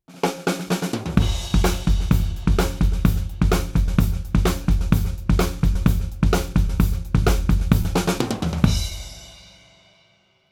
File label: Comp On Verb Off